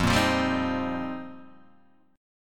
F 9th Suspended 4th F Guitar Open D, chord

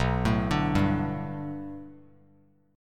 B Minor 7th Sharp 5th B Bass Standard, chord; 7,12,12,0; Bm7#5 arpeggiated; JGuitar

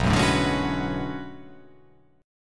B Minor 13th, chord; Bm13 strummed; 7,9,7,7,8,8,8,8; JGuitar

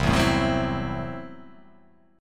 C 6th Flat 5th C down

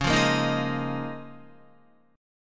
Db 6th Add 9th Db down Guitar; Db6add9 strummed; JGuitar

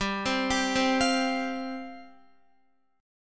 G 7th Suspended 4th G down Guitar

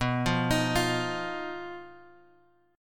B Major Flat 5th B, chord; BMb5 Chord {X 2 3 X 4 1} arpeggiated

